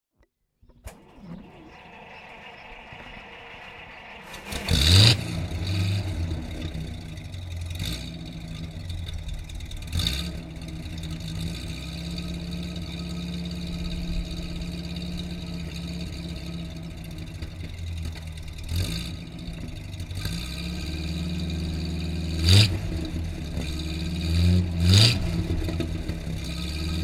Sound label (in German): Buick Eight hinten; Schweizer Wertarbeit aus den Dreissigerjahren